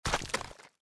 giant rat foot, npc

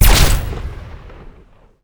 rifle laser fire; weapons

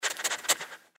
giant rat sniff; npc

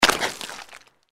giant rat jump; npc

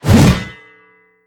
swing metal

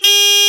horn

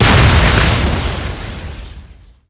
explosion grenade plasma high; weapons

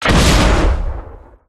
rocket launch mono; silverback

